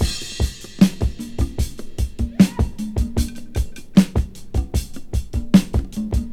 76 bpm drum groove f key; Duration 0:06

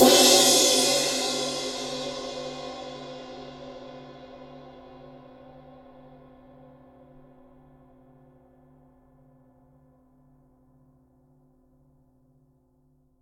crash single hit c sharp key

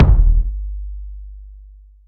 big room kick g key

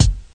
acoustic kick drum f sharp key; kick drum samples

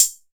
dark hihat sound a sharp key

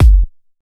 subtle reverb kick sound F sharp key; kick drum; kick drum samples

